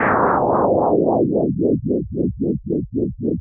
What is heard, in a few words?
Air FX, Filtered Noise; noise hiss crackle